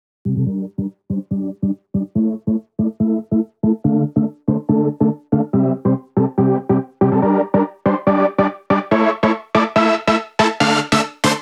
PWM Kit 142BPM Chorus - Lead DRY, Gmin; Vengeance - Essential Clubsounds Vol.5; Melody & Synth

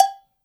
COWBELL; InVision Interactive; Percussion Slam; ITCHY

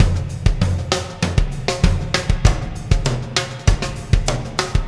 Beat, Processed Drumkits

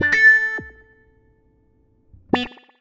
Wah Wah Gtr

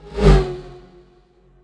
DOPPLER; E-MU Producer Series Vol. 2; More Studio Essentials; Composer; Technopourri

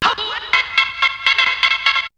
Drumloop; ZERO G - Datafile DISC 3

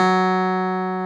CLAV F#3; Roland - LA Composer vol. 1

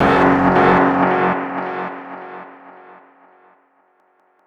philter (tech hit); Sonic Foundry (Sony Creative Software); Ugly Remnants VOL-2

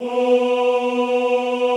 OOH B2, Classical Choir; Best Service; Extended Classical Choir

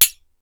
FX Sounds, Drum Machines E-MU Pro-Cussion

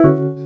RHOD CHORD; Ragga & Dub Reggae; PIANOS; AKAI; USB Soundscan vol.27

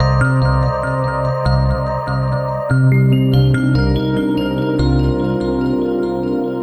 NRG Pad

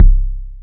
Bass Drum, Cwejman S1 MK2

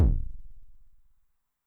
BD MOD [Pitch+Depth+Overdrive], 909mod, BASS DRUM; classic machines

